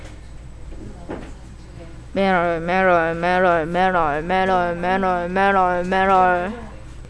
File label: metal